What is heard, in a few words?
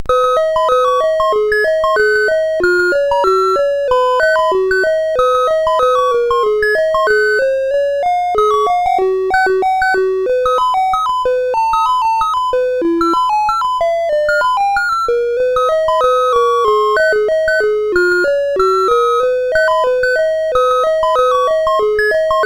Buzz Off, CSG8580R5; GAMES